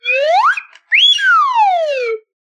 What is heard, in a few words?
slide whistle good lookin